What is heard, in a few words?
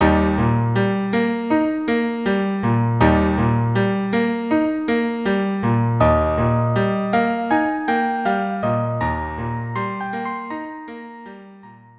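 violin cello; intermezzo; carmen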